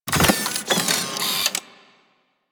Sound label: Camera Consumable Unequip; Warframe